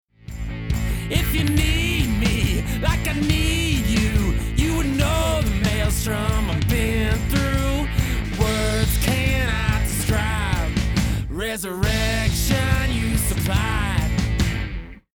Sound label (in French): full mix sans